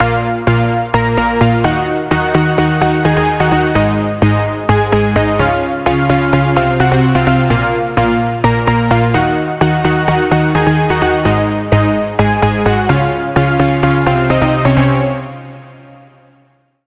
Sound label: Lead, Spire; Routed Sound; Spire Expansion - Star Scavenger Vol.1